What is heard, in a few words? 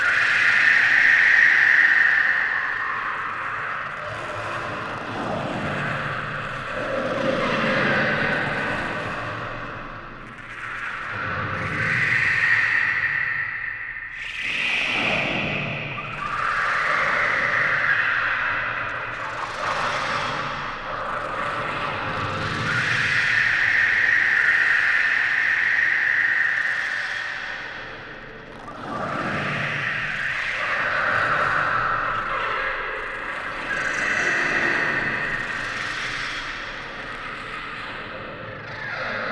Group Amb loop; Bahro